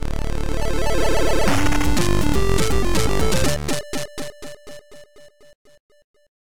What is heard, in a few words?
Level Complete; Captain Drexx